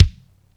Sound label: small reverb kick sound f key, Royality free kick sound tuned to the F note; kick drum samples